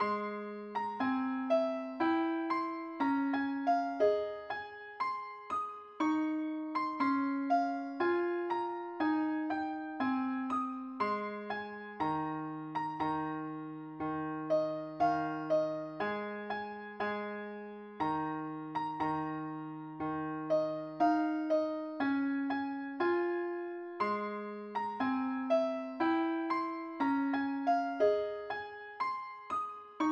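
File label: mandolin key a flat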